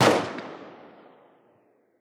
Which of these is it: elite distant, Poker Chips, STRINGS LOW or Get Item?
elite distant